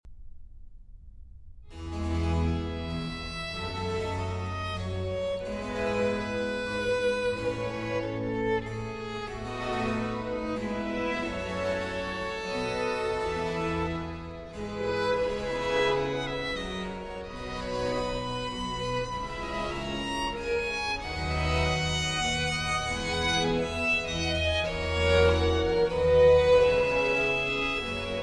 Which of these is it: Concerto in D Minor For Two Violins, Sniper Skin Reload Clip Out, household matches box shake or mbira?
Concerto in D Minor For Two Violins